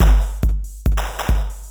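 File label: DRUMLOOP ELECTRO 140; Techno2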